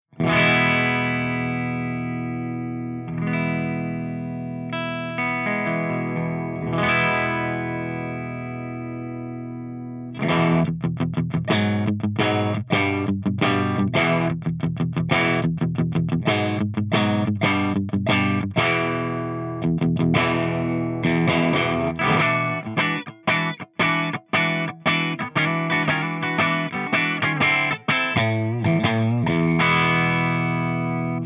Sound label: ENGL SOV CH1 CLEAN V30 P90